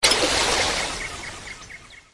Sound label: Click; Lifewater; The Great Ottomans Strategy Battle for Throne; android